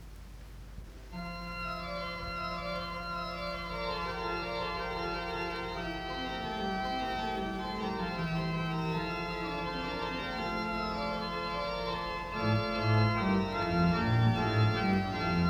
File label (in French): Recital D'Orgue Les Cloches